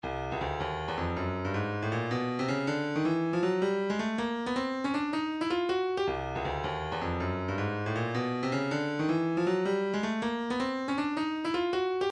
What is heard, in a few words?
kick, midi